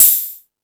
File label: High Hat, Drum Machines E-MU Pro-Cussion